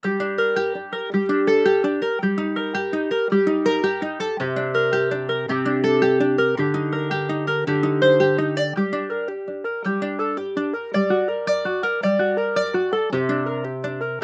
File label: Melody Loop 110 BPM G Min Guitar; Loop Stems; Cymatics; 2020 Melody Collection; 2020 Various